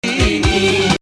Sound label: Hijaz